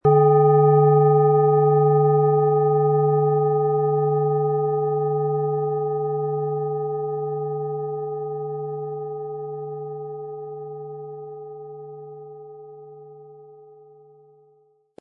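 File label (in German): Planetenschale Saturn & Uranus, Ø 18,6 cm, 700-800 Gramm inkl. Klöppel; Stärke Deine Selbstdisziplin & Löse Dich von alten Strukturen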